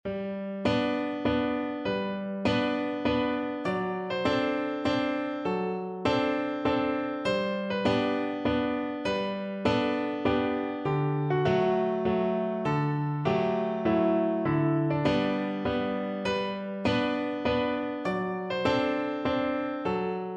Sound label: la donna, piano